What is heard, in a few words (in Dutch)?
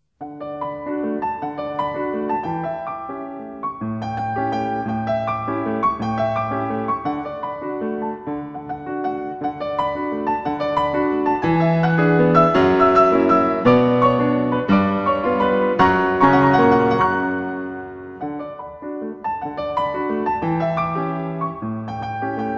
Wals instrumental; Festival di Idioma – Aña 5